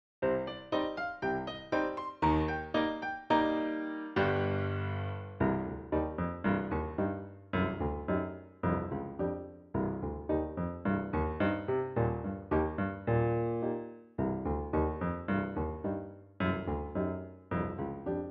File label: Tuba; in the hall of the mountain king